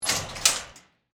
Gate Open Locker, Industry, Gate, Open, Tool, Locker; Gates; Doors; BlastWave